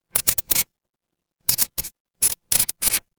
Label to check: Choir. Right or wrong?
Wrong